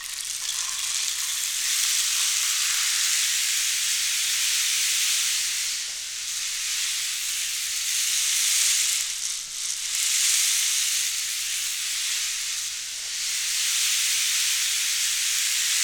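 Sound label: percussion rain stick; Roland L-CD701; Water Perc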